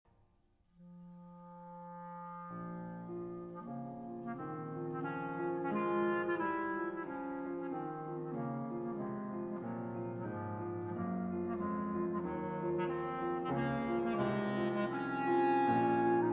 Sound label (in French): Clarinette